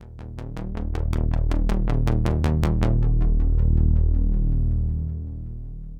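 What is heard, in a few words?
synth bass, ambient, 160, C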